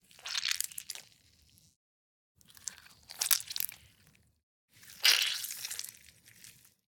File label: Vegetable Carnage, Orange, Squish, Medium Length, Medium Strength, 3 variations